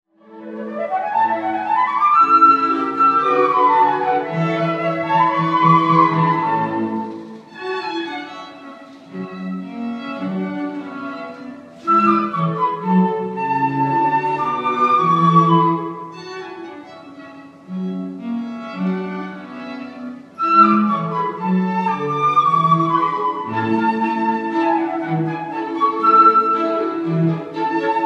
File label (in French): Quatuor pour flûte et cordes; Mozart